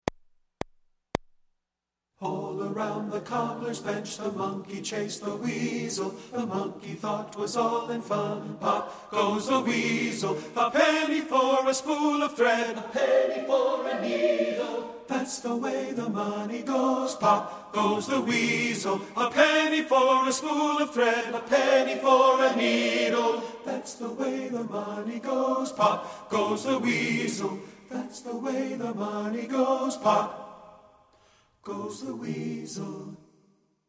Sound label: TENOR; POP Goes the Weasel